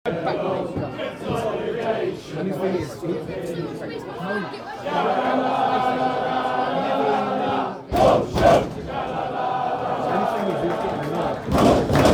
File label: lardy boys singing at horsham fc